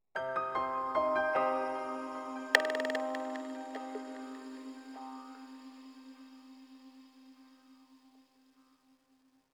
Oneshot Piano And Perc; Zombie Army 4 Dead War; PS4 Stadia Switch Windows Xbox One

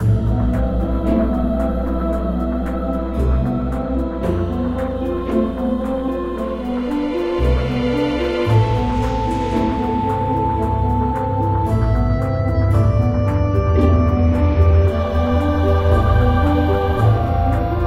Castle Keep Build Up Low, gamerip

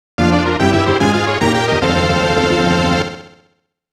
Skill Item; Estpolis Denki I II Super ROM Cassette Disc in Taito Vol. 1

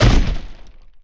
Pack New Card Slam; Golf Blitz 1.12

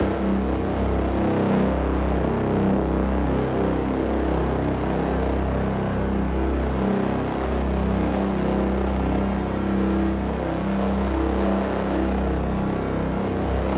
Asylum Drone Strong; get even gamerip 2017

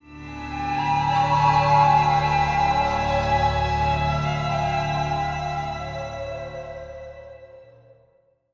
Snakes Music Stinger

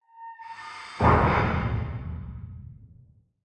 windmill pump

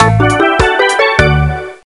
Song Win; Girls Only; NDS

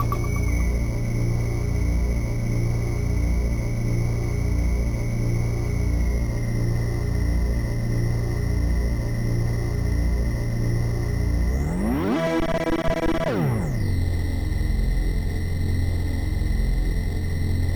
Soul Tomb Ambient; Perihelion The Prophecy; Amiga

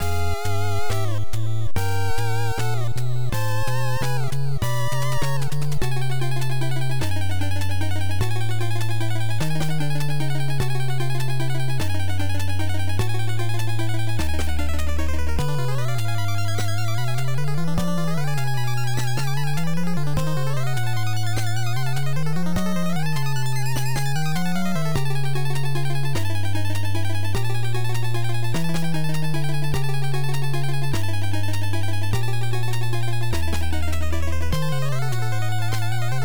Boss Fight; Castlevania III Dracula's Curse

